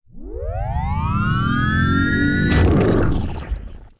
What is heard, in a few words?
boo ghost trap